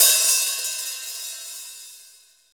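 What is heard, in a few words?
cymbal, Roland; Club-50 - Foundations Roland; Ext.Snare 2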